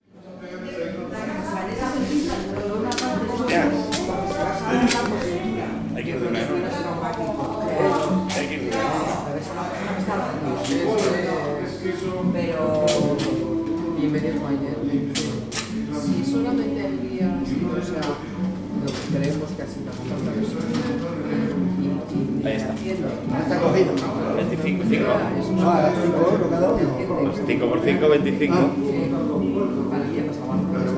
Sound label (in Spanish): ruido ambiente; bilbao; portu berria; soundfood